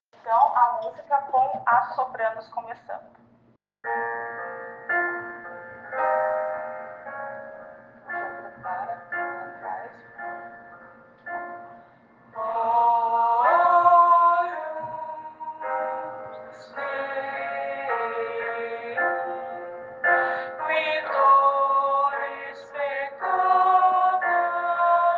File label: agnus soprano; coral sao francisco; missa vigilia pascal